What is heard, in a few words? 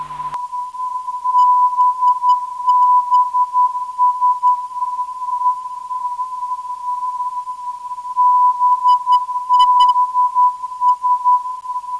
320kHz, ndb